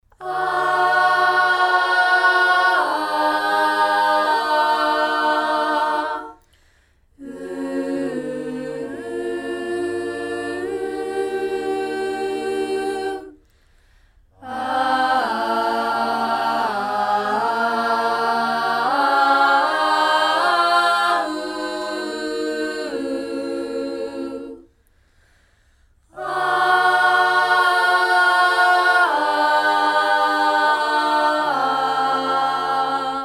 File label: Tenor, parts